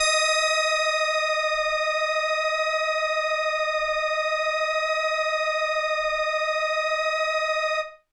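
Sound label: Indie Organ Kawaii D#1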